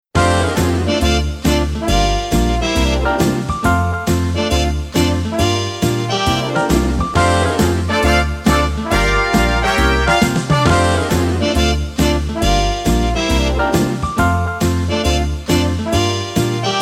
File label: Lowrey Swing